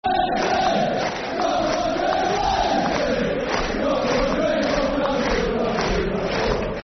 Wandering Wednesday, Football Chants (Soccer Songs); Sheffield Wednesday Swfc